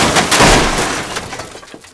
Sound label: waste stove die